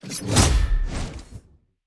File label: Normal Attack; Marie